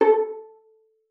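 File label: violin pizzicato A4; Xsample VOL-5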